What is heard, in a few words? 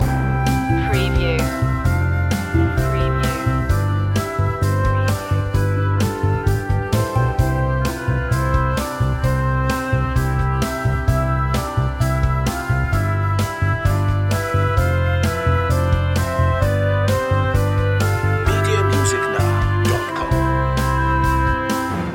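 orchestral loop; o come all ye faithful; media music now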